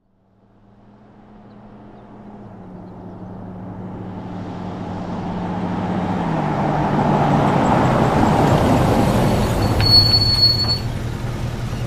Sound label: Wagoneer: Medium In, Idle And Off, With Brake Squeak, Jeep Wagoneer